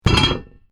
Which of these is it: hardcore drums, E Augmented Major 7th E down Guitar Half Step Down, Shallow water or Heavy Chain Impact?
Heavy Chain Impact